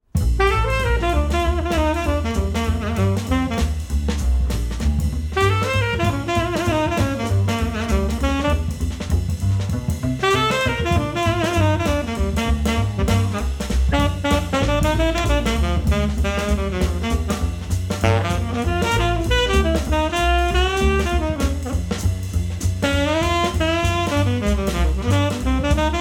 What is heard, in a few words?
Blues, sax